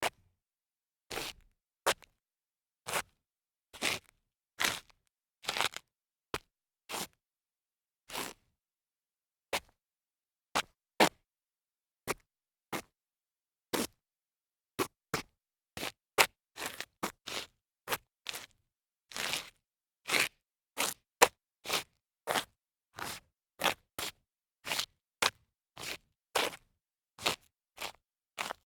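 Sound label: sneaker scuff concrete, foley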